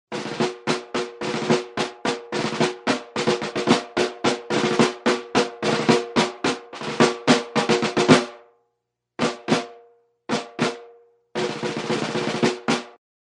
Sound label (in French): tambour